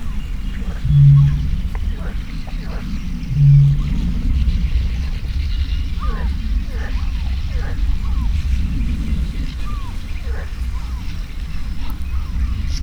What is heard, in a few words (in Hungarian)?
kétszer repülő és kecskebéka, bölömbika; hortobagyi nemzeti park 2013